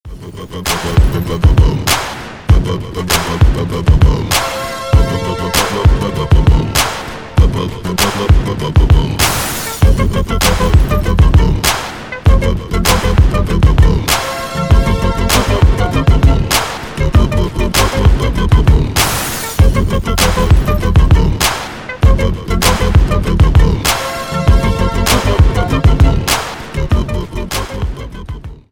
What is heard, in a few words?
instrumental, ringtone